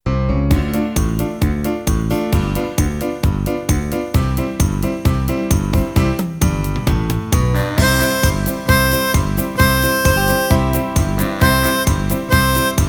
Harmonica to listen to, blues harmonica; Our first song

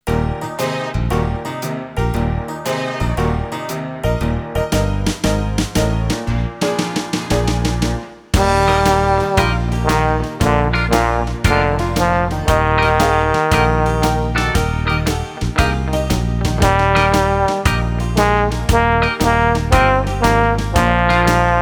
trombone

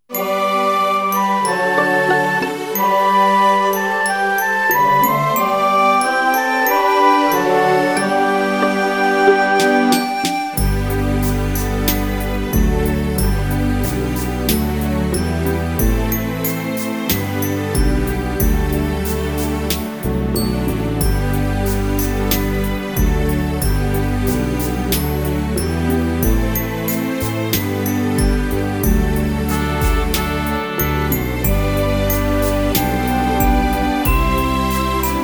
Violin

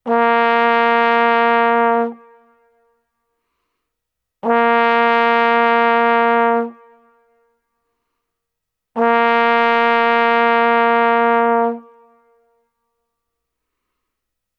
Trombone Tuning

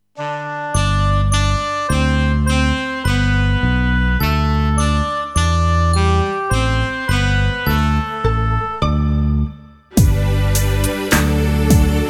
Violin to play along

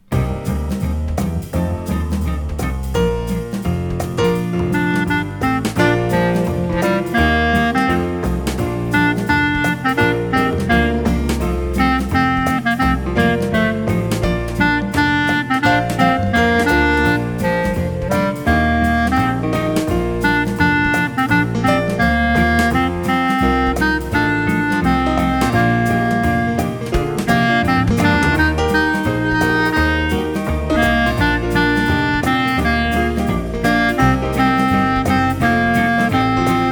Clarinet to listen; Down by the riverside

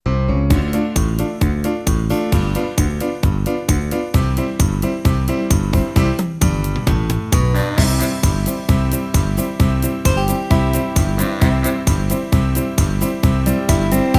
Harmonica to play along, blues harmonica; Our first song

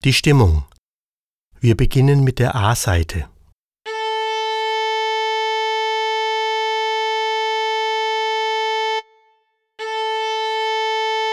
Violin Tuning

